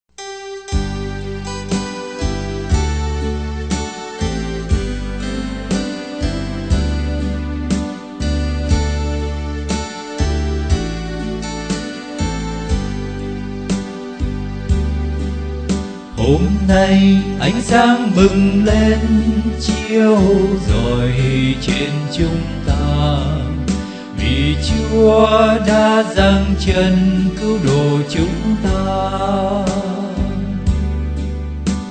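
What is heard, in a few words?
soprano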